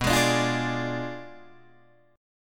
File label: B Diminished 7th B down Guitar Open D